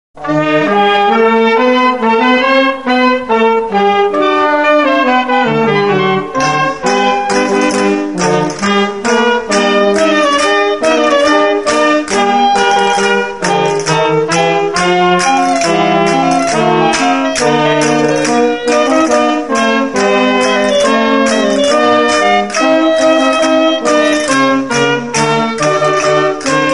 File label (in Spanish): Bolero Procesional; Ball de les Llauradores